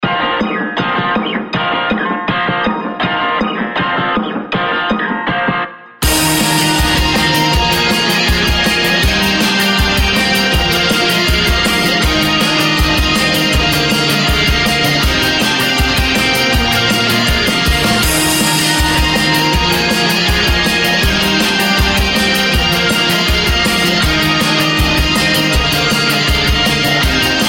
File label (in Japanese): ポップで青い勢いのあるBGM BPM160